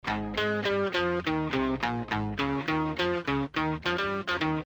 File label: Guitar